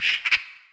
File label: magpie; World of Tanks Blitz; ambient medvedkovo